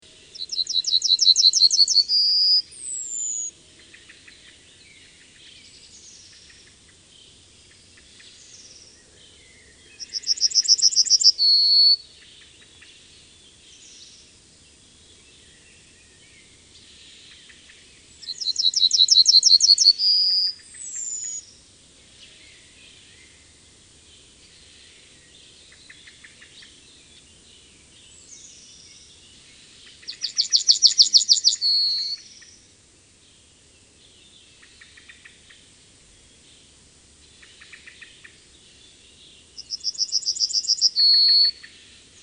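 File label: Yellowhammer; Madarak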